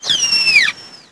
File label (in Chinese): 海豚 攻击; 红色警戒2; RA2; 作者：西木工作室